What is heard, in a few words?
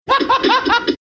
laughing